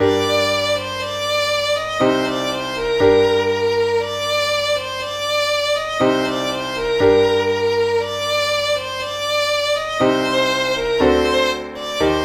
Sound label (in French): sol mineur; chant; lluba